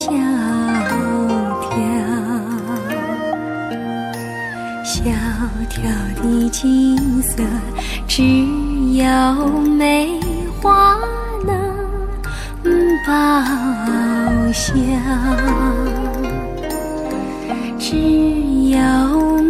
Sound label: beautiful chinese song ringtone